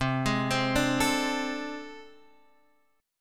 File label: A Minor Major 7th Double Flat 5th